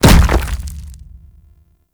Golem Step Default; Warframe; Footsteps

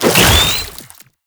Brood Spider Jump; Warframe; Oraxia

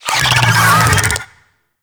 Infested Big Ashen Vocal Attack Death; Warframe

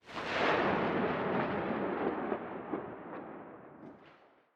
Forest Night Distant Thunder; Sacrifice

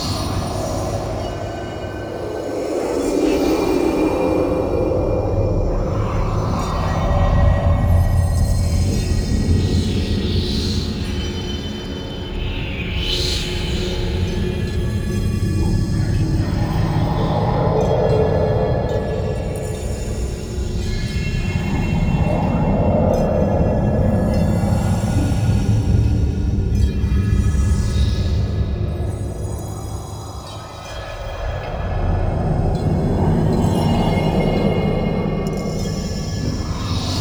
Moon Interior Amb; Warframe; Ambience; Orokin Moon

